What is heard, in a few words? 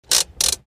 hand brake